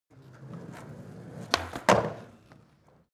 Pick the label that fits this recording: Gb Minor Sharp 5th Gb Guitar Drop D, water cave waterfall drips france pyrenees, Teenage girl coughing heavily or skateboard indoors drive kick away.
skateboard indoors drive kick away